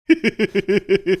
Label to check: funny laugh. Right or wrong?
Right